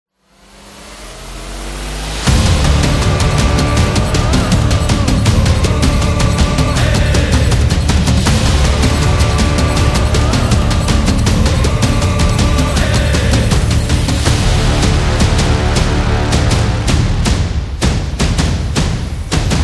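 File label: Gulf Cooperation Council, epic cinematic trailer music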